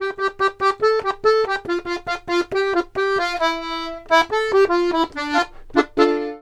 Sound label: Accordions; USB Soundscan vol.40; AKAI